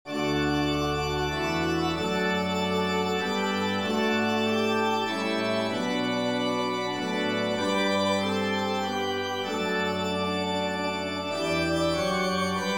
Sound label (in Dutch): Allen Orgel; Datwijalsboden